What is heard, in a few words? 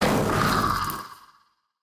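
Spoggle Yellow death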